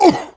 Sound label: pain sharp; Heavy; Heavy taunts; When the Soldier snaps his neck, his head turns 90°, eliciting a pained grunt